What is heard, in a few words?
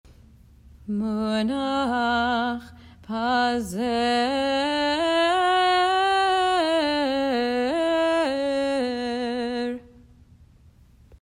munach pazer; Cantillation for High Holidays